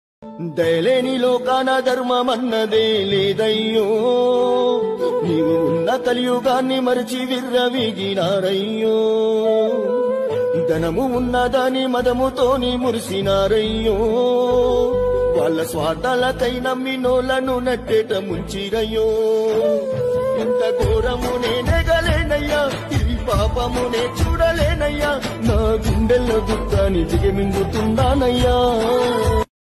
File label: bhakti ringtone bhakti music bhakti bgm; ayyappa swamy